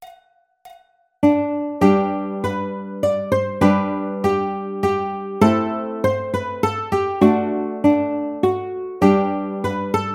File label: Ukulele; Ash Grove; Ukulele Solo; Celtic: Ye Ole Ireland, Scotland, Wales and (sigh) English